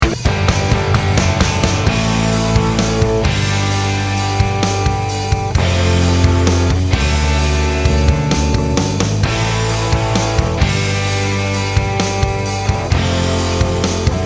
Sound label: one way to the top solo without lead guitar